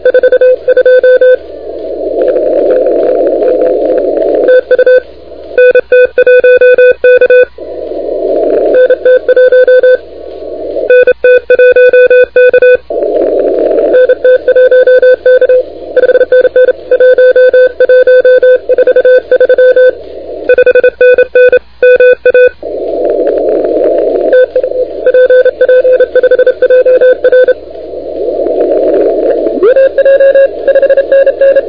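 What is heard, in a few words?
continuous wave 28MHz; ARRL10M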